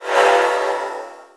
trans in; gearbox; klingon

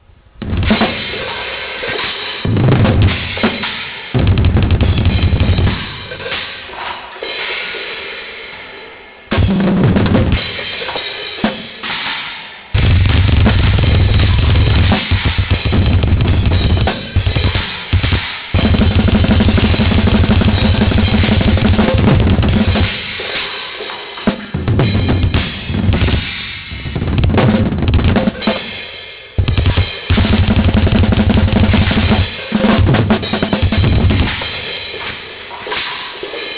Drumming; svencoop; baari md music

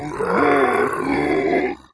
zombie voice idle

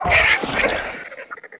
death, aliens facehugger; svencoop

